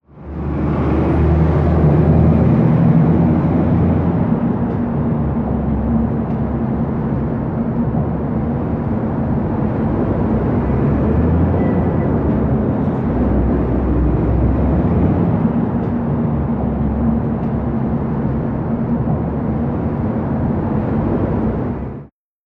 Cities nyc Traffic rumble

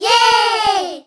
Kids Yee

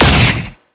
Shoot; Skylanders Cloud Patrol; Arcade, iOS, Mobile; TreeRex SFX

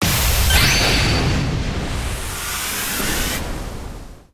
Team Skill Cutin Great

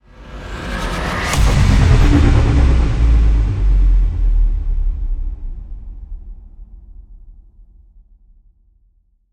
Stinger Modulate

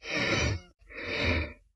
Cooldown Breathing; Lethal Company

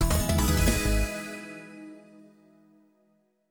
High Score Table; super street fighter ii turbo

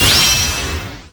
Team Skill Tap

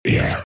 Monster Grunt; The Binding of Isaac

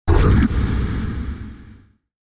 Satan Spit

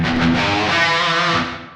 Electric Guitar Special; heartslash

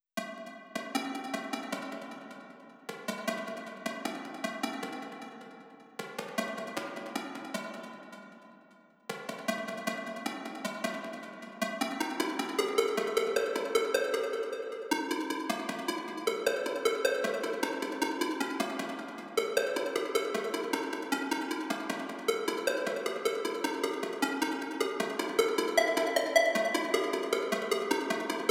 Synths 154.675

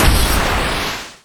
Shock Wave Impact

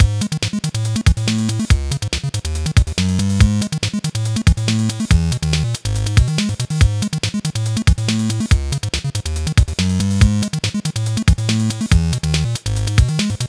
Prebattle

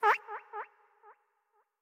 Gigabat Hurt

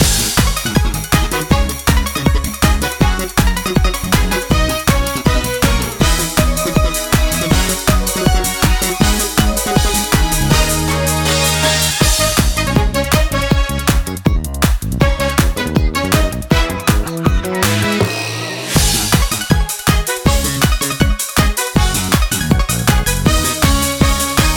Kart Racing Castle Hotel Main Loop

